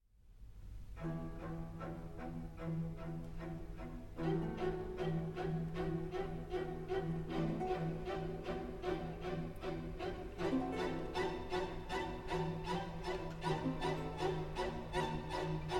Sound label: Violin Concerto in F Minor; Op. 8, No. 4, RV 297 L'inverno